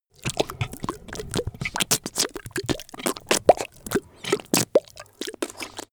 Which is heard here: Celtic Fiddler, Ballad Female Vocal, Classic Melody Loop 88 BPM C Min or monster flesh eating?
monster flesh eating